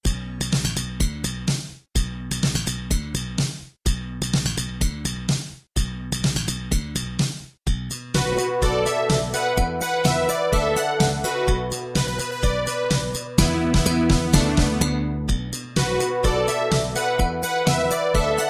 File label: BACKGROUND